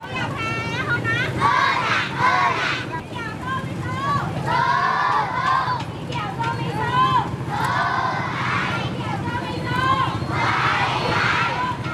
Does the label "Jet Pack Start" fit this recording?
No